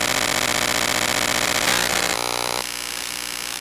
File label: Noise, Pick Up Coil Noise; contact mic madness